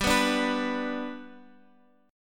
G Major down Guitar Open F; G strummed; JGuitar

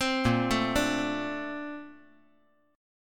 A sharp Major 9th; arpeggiated

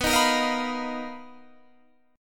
B Major Sharp 11th, Guitar, DADGAD

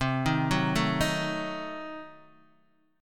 C Diminished 7th down Guitar Open D

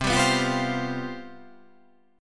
C Major 7th Suspended 4th

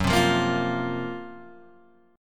F sharp Major 7th Flat 5th F sharp, Guitar Open G; 4,5,3,3,6,3

